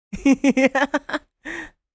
Laugh anime boy vocalization

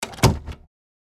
Small wooden door close; household

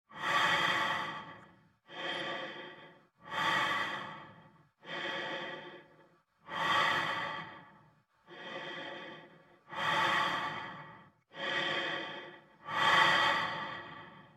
Gas mask normal breathing; military equipment sound effects

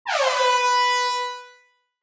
Reggae air horn B3

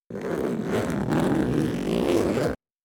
Large hard case suitcase zipper

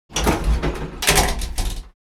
Service elevator door slide and latch

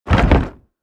Platform wooden game menu ui error

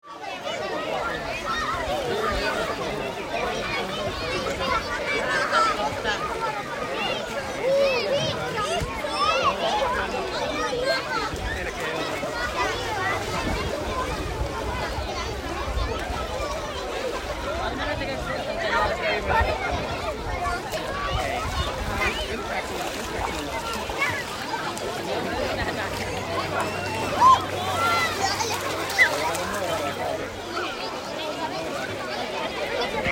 Beach ambience windy day voices and people

